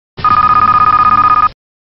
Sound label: phone sound; deal or no deal